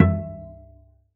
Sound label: Cello D Bass